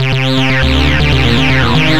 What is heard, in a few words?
acid 120